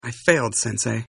lose; leo

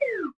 fade OUT; Crash the Bash - Drink Break

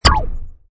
bomb stamp count; Danger Mouse - Ultimate

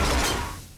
cannonbolt roll land; Ben 10 - Hero Time Game; ThrillGames

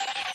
skid in; dorothy; run-dorothy

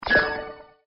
Shield; Mr. Bean - Bean Skidding

